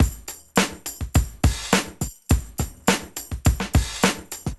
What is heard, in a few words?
LOOP 104